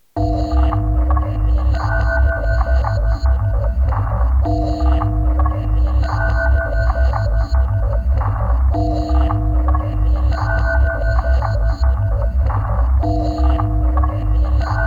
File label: Bgm; neon sea